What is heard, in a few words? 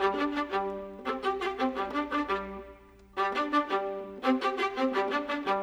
Viola, Orchestral; Sonic Foundry (Sony Creative Software); Orchestral VOL-2; Modern 09